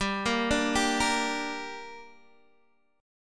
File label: G Minor G down Guitar Open D, chord